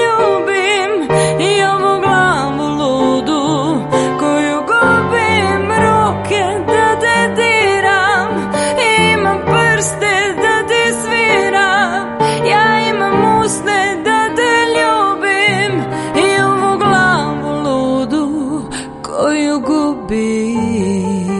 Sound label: PIANO